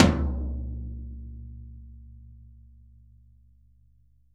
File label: VINT KITS; Sonic Reality - Vintage Timetraveler